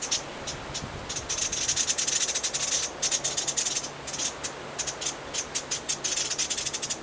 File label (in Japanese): uguisu alarm